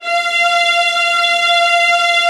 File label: VIOLINS, Synclavier Strings; ILIO